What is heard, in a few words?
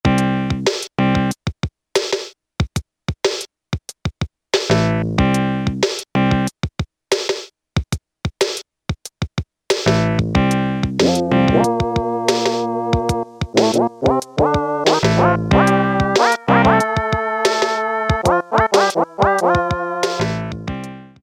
FUNK 2, Casio Rapman rap patterns